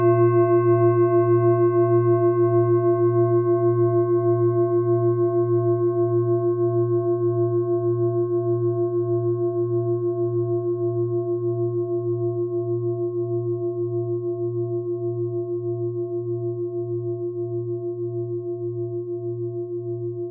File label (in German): klangschale ladakh